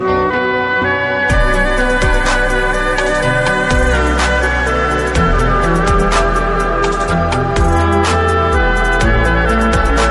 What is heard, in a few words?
flute bgm